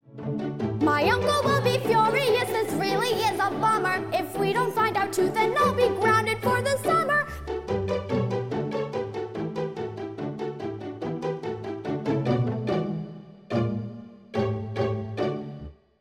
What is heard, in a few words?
Orch Only; Harmony Audition; 2019 Space Opera